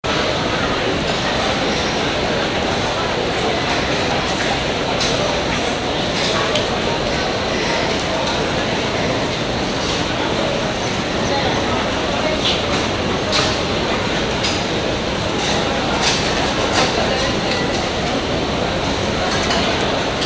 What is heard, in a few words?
market atmo in china